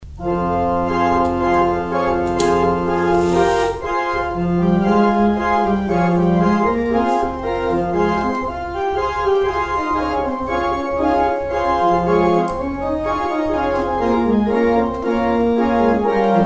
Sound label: organ outro